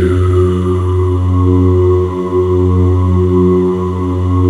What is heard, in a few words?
DOO G1, Synclavier; ILIO